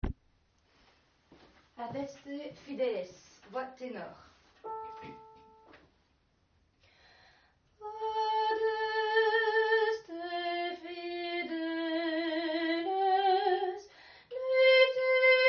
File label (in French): Tenor; Adeste Fideles